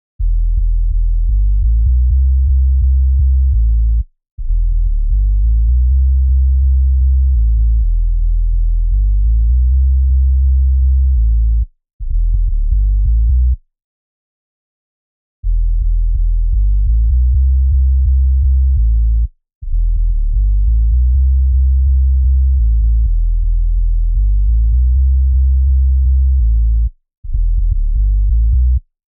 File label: Sub Bass, 126 BPM G; Future House; Big EDM; Future House Tools; Construction Kits; Let The Beat Go